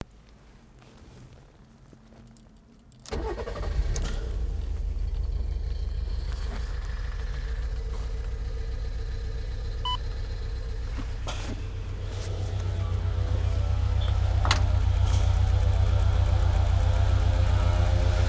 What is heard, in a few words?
coil broken